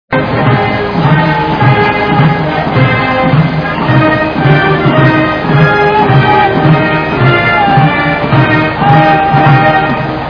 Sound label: blues slow